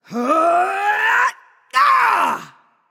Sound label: battle cry; smite; aphrodite